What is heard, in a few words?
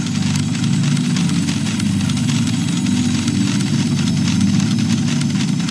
button Control Unit Stand; Doodad